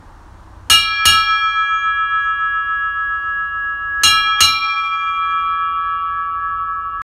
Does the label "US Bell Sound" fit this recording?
Yes